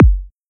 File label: Kick Club